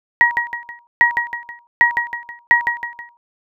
drip

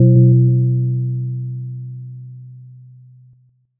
gong

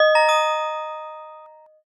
gamelan

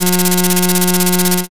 slot spin

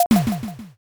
toaster

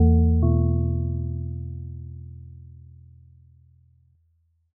china gong

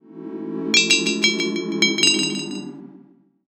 tavern

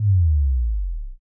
sub drop

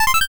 combo

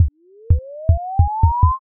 pulse

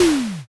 rock crush

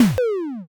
knockback

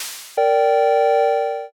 reveal

